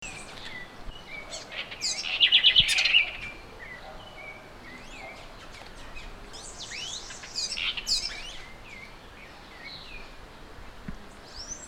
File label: Troglodytes musculus